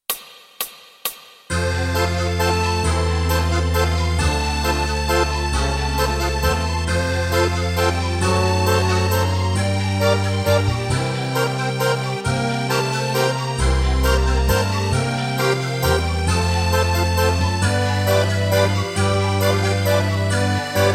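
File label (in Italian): Fisarmonica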